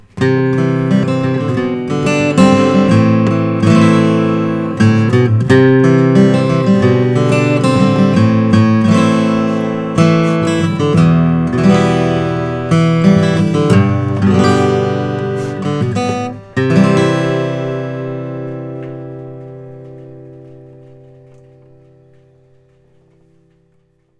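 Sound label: martin custom 000 14 fret, guitar; short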